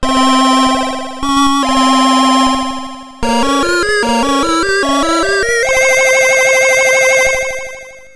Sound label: Constellation Won Jingle; wicked; atari st